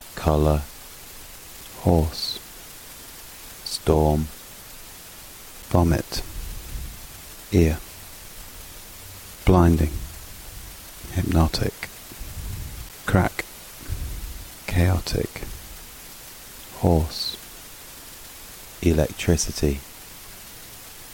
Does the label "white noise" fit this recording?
Yes